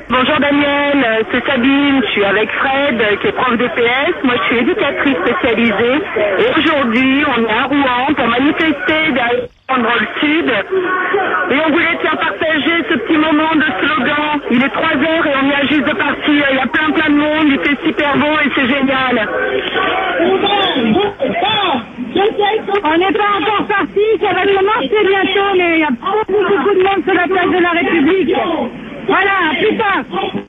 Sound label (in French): manif Rouen; Reportable manif 4 oct 05